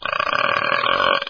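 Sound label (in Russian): отрыжка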